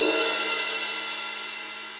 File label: Ride Direct Natural; Drums; Drum Hits; Session Drums Studio; MPE Hits